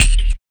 RSS, 160 BPM

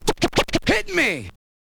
scratch kit, vinyl

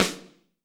SNR POP, Snares; Roland - Rhythm Section; Snares 4; Sn Modules 4

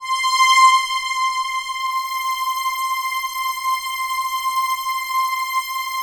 COSMIC, PADS; Masterbits; Vintage Voltage

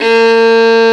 fiddle; Violin 4 nv

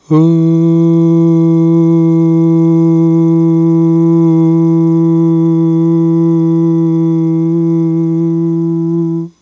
AHHH, Vocal; Polyend

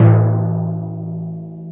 TIMPANI

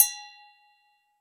GOGO HI, NEWJACK; Drums In Your FACE!

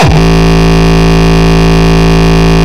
Longkick, kicks

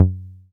SYN 303, Roland TB-303

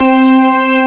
ORGAN C2; AKAI S6000 CD-ROM - Volume 1; SAMPLE WAVE MIXING 1

